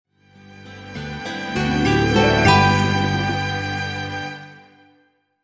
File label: Music Logo Latin Bos, guitar